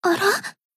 Battle Damage; Tomoe

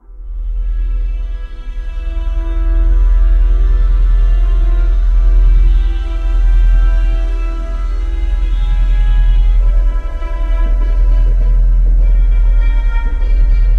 nervous intro

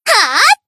Battle Shout; Asuna Bunny

